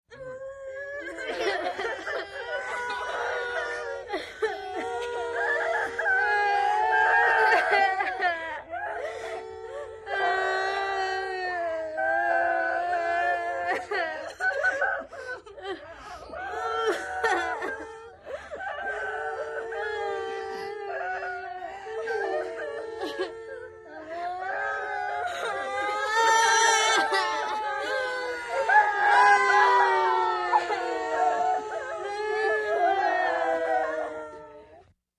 5 Kids Crying Halfhea

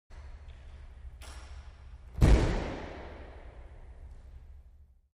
Racquetball Door, racquetball

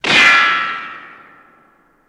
Bullet Ricochet Ban, riccos

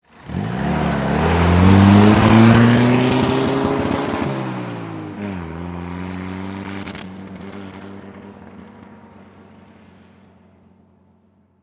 Army Jeep Start Idle

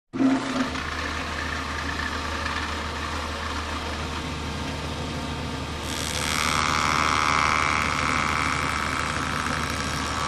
Joiner Wood Turn On St, joiner

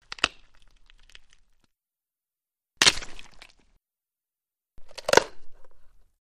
Bone Break Large; gore stabs & eat

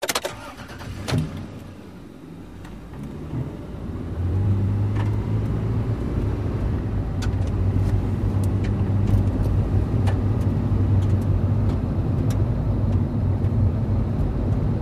SUV Engine Accele

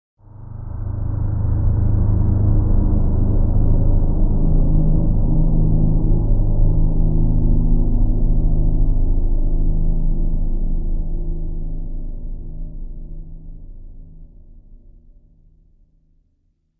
Bed Impending Galact

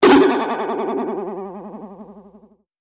Boing Box Vibrato

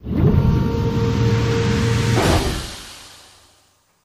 Airlock Door Close

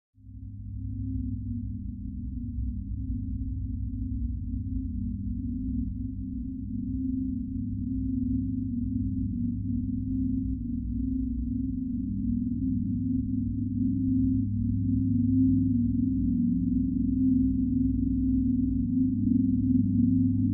Bed Fluttering Elect

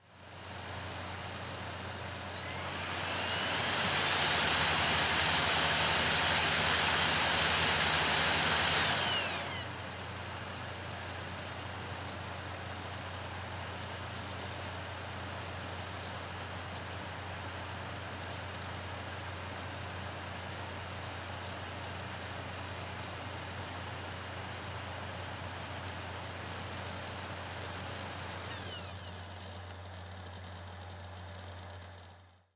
Computer Cdr Reading

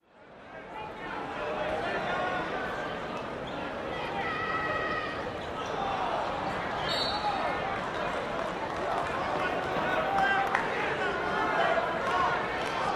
gym crowd cheer, cheers

